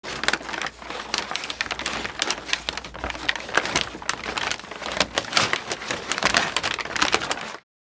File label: Christmas toys wrapping paper long; gfxsounds